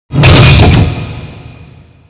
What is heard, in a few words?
SPIKES